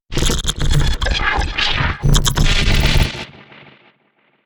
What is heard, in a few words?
portal glitch